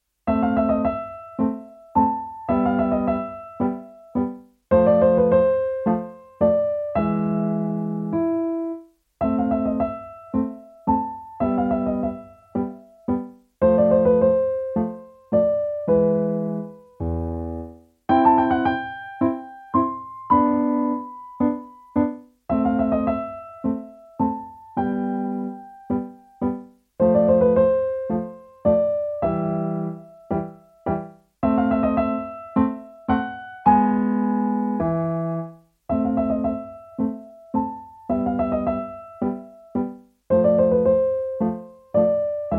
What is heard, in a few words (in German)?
Tango trifft Barock, Endtempo